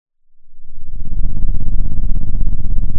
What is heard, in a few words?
Sound of an engine revving